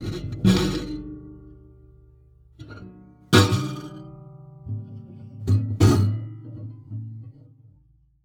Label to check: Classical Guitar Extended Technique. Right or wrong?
Right